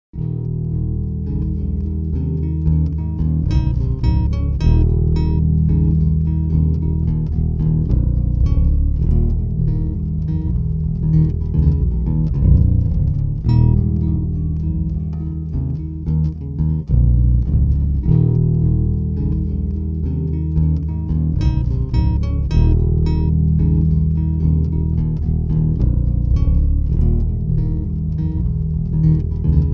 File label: bass